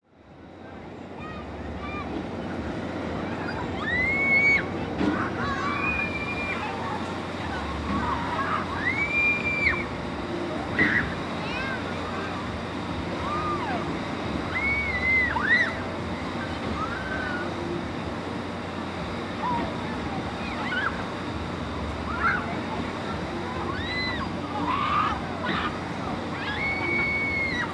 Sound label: Girls Scream On A Carnival Ride